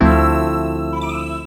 E, Drum & Bass Pads; Total Drum&Bass; 90 s Sample CDs

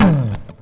FUNKY, Grooves

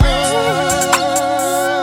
wail, breaks

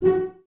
CES F#4 Pizz, Cellos, String Ensembles; Miroslav Vitous

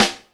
SD, Dance Mega Drums; Best Service

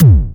PERC FX, SYNTH PER

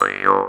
MT G, JHARP G B; Xsample VOL-9

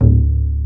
BASS D2; ORCH.COMP

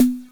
Drums, Hits; DCM8

